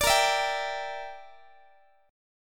A sharp 6th Flat 5th A sharp down Guitar 4A,4E,5A,5D,5G sharp,6C sharp, chordshape; strummed